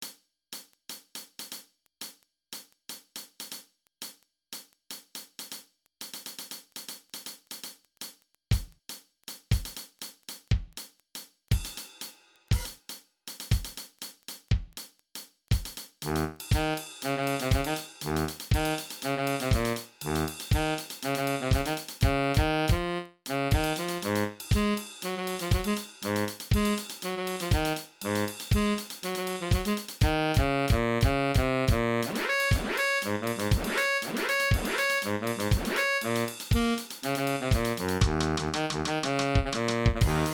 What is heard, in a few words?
MIDI